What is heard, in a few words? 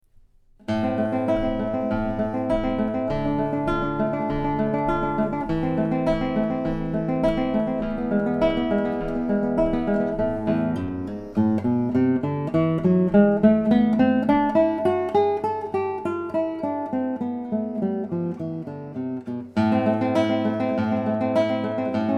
Sonatina; Carulli